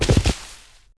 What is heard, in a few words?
Horse Run Forest; Civ4